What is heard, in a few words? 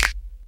Snap